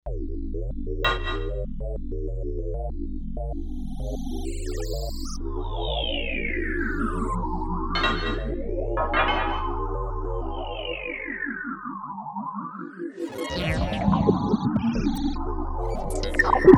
Cyber FX, Kawai K5000S

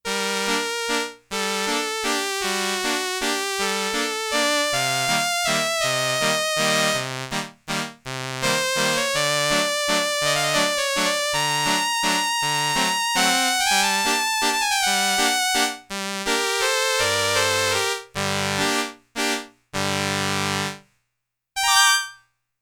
STRINGS II with vibrato, Roland RS-202

